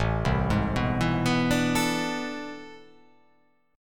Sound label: A Minor Major 11th, down